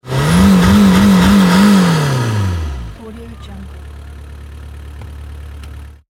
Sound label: sport car revving